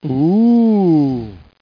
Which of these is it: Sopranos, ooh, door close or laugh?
ooh